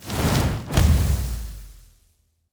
Fire Spell, Magic Sounds; Fantasy Sounds Bundle

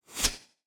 Arrow Fly; Fantasy Sounds Bundle; Medieval Combat Sounds

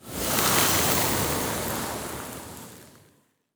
Ice Spell, Magic Sounds; Heroes of Hiis; Fantasy Sounds Bundle

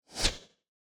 Arrow Fly; Fantasy Sounds Bundle; Medieval Combat Sounds

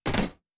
Armour UI; Fantasy Interface; Fantasy Sounds Bundle

